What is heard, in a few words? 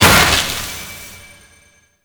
Shield Crack Large; Vehicles; Abilities; Thano Mech; Warframe; Nechro Mech